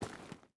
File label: footstep boots stones walk; Rust